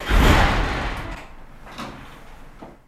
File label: Cannon Neutron Clun, whooshes & swishes